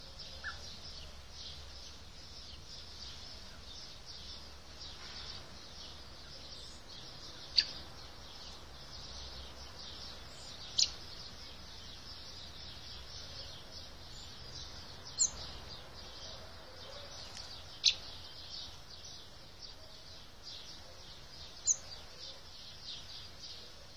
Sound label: Turdus merula; SETE